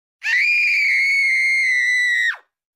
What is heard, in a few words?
red larva scream